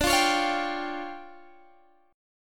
D Minor Major 7th Double Flat 5th, D, Guitar Half Step Down